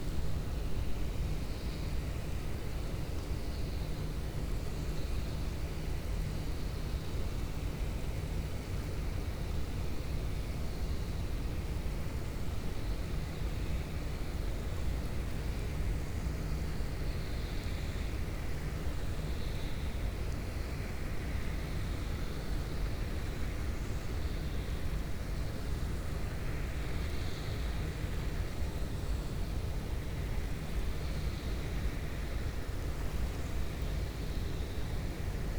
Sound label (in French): cachalot negatif, Physeter Macrocephalus